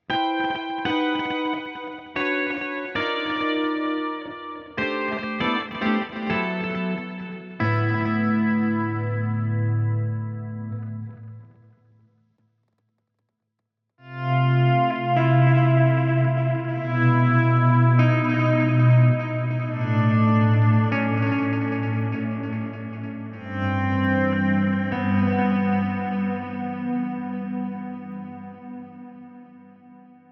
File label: Multitaps